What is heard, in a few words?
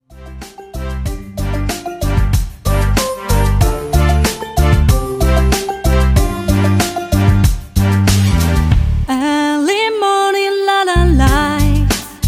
Jazz